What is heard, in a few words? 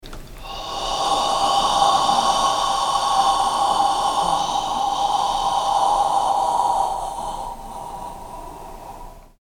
Six Healing Sounds Qigong 呵 HE